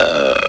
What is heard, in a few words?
belching; simpsons; barney